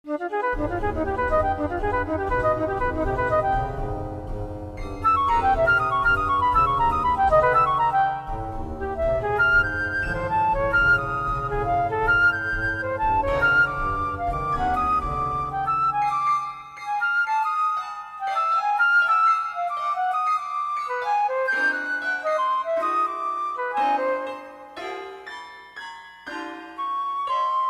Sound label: Dig Flute